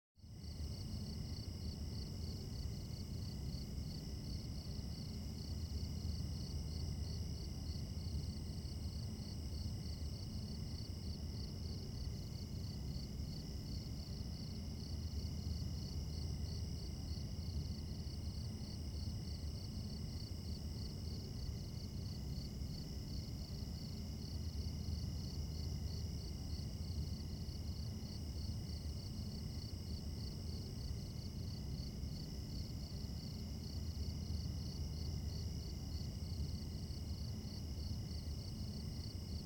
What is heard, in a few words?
Night - Suburban, Mostly Crickets, Distant Highway